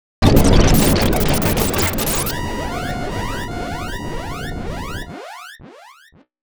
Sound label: crash; mother2; Sky Runner